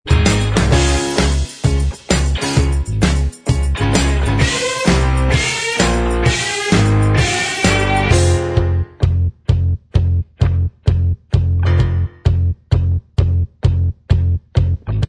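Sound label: Inst Track, instrumental track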